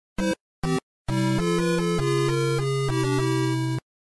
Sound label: game over music